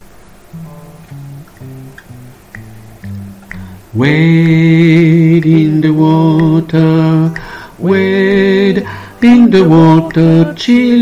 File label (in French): basse, chorale